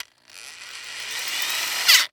Industry Tool Drill Power Drill Auto Tighten Drill Bit